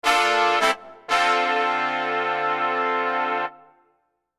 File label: Musical Stabs Brass Jazzy; Blastwave